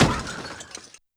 Car Crash

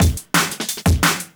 175, Breaks; Drum&Bass; EZ Rollers

